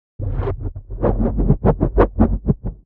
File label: torpedo; World Of Warships